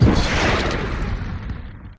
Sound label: bang; WILLIAMS; INDIANA JONES; Indiana Jones Original